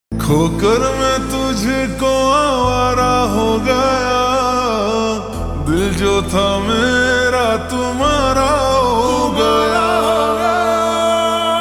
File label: Ringtone Slowed Reverb